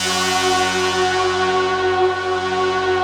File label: rough stack